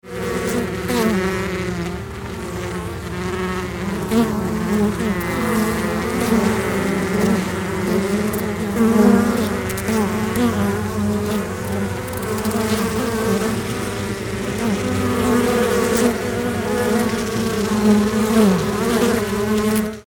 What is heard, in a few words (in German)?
bienenstock nahe; waveland tonstudio